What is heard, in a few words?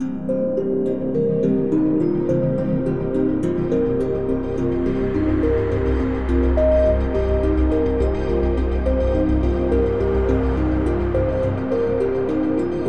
Ambient Music; ZED; Planetarium